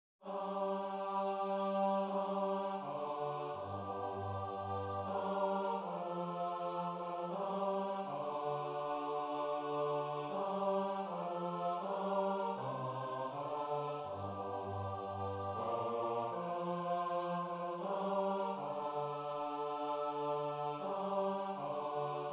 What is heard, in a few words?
bass; coventry carol